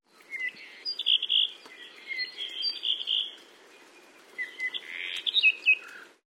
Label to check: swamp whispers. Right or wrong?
Wrong